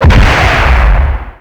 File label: Blast Boost; Vehicles